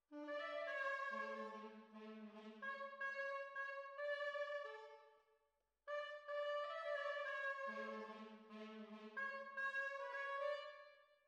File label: Tromb ASS